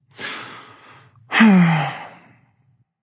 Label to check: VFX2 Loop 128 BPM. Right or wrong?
Wrong